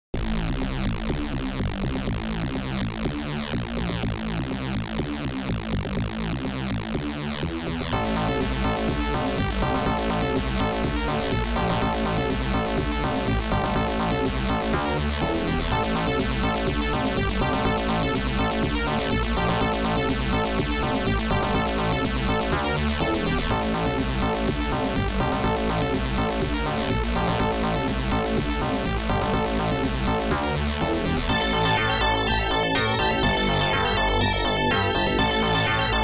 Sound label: OPL2; Costa Rica